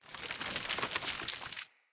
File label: Grunt Foley Summon Dirt; Enemy; Characters; Corrupted Sproutfolk